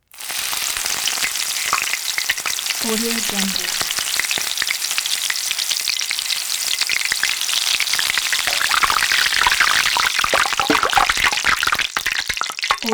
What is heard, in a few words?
sizzling bubbles; prosoundfx